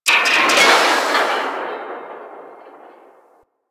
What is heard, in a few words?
Landscape Construction Site One Off, Ambience; Warframe; Venus Open World; One Offs